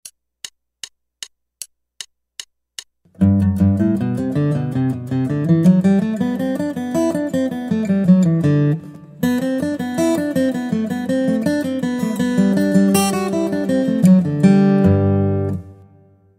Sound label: Guitar